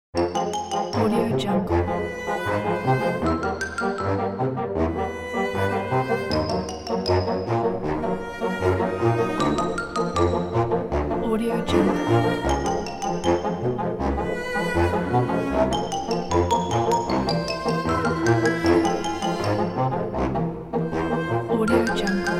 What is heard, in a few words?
fast woods cello comedy